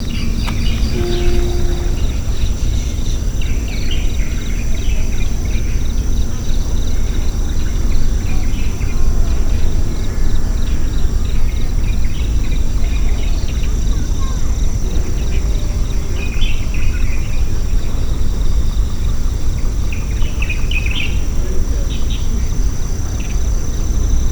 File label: Chengdu Pandas; Sound Scapes